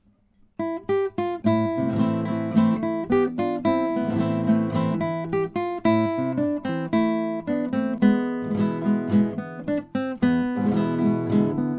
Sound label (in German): duett